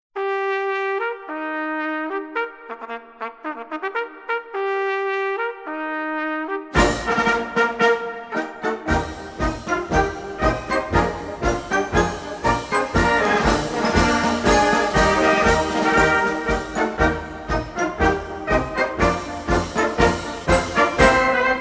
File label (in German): POSTMARSCH